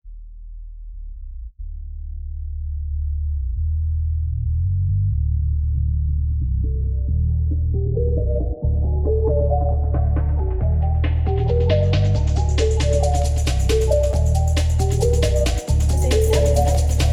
beats

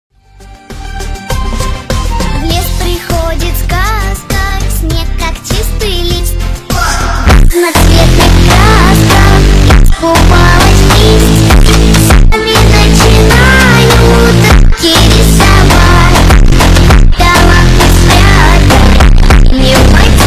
ultrafunk brazilian funk

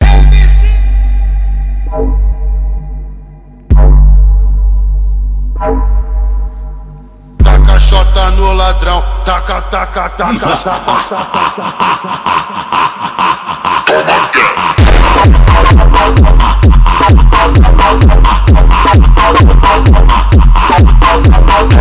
funk

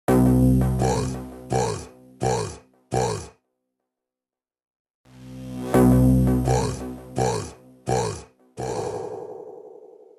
slowed; russkaja pop muzyka

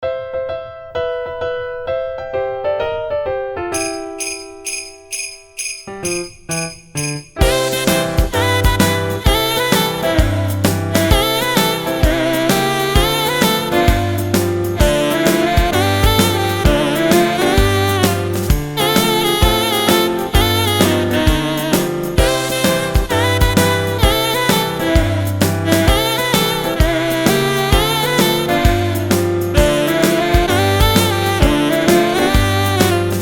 jingle bells happy new year; russkaja pop muzyka

